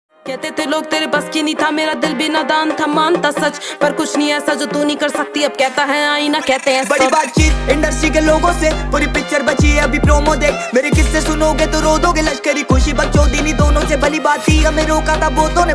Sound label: Victory Anthem Ringtone